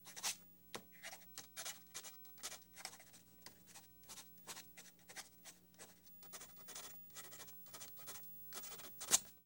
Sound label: Quill Writing Movement; Home & Office; 1001 Sound Effects